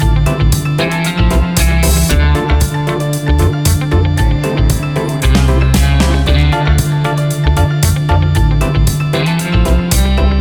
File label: Cuban Reggae Music